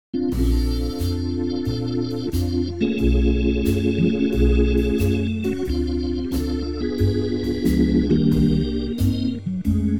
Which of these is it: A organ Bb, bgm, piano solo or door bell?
A organ Bb